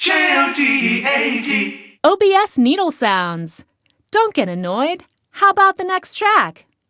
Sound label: Radio Jingle; let it die; OBS